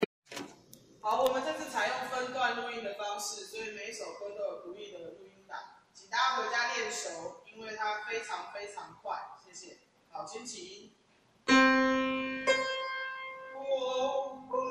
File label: bass